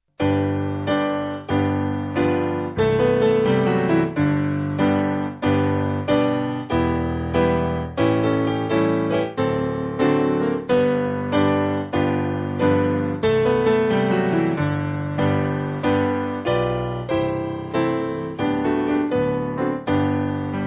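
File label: Piano, church music backing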